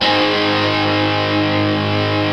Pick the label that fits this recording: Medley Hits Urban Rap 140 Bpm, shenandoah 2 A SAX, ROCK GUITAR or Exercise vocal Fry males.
ROCK GUITAR